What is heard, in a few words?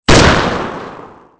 gunshot; Soldier Of Fortune II Double Helix; XBOX